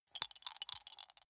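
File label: small three rattle in hand closed short, dice; wuerfel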